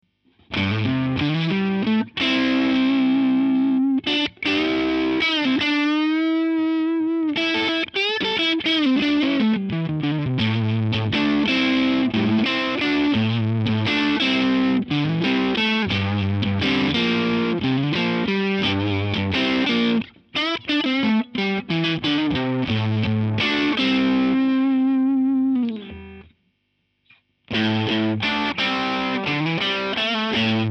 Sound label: Lead; ACE Colt